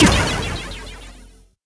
deactivated

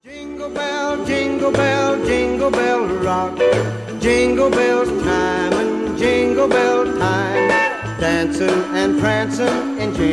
jingle bell; christmas; cstrike

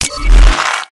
nanosuit strength switch; WarGodsShop; cstrike

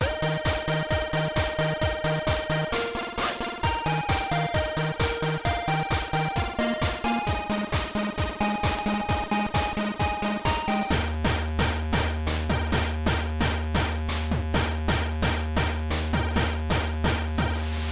green level, ambience

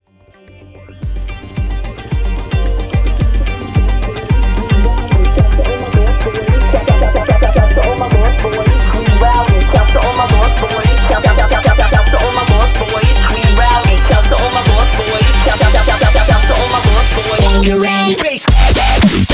future music; deathrun crash2; cstrike

